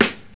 1990s original hip hop steel snare drum g sharp key